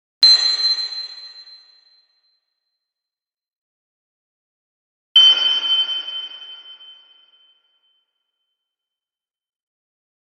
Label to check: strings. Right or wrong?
Wrong